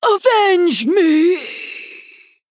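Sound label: Female Draenei Death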